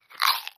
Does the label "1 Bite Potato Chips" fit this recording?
Yes